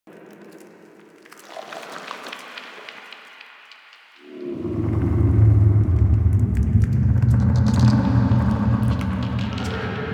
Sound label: Exterior Hub Loop, Ambience; Warframe; Infested Eris; Amb Loops Exterior